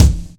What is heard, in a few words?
good kick one shot e key; tuned drums e key